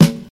short snare drum sample E key, snare drum sample tuned to the E note